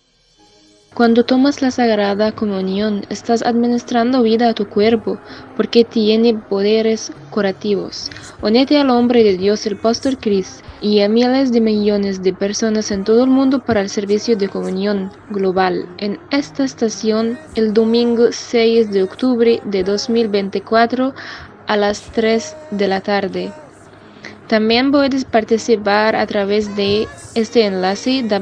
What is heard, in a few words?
ARABIC JINGLES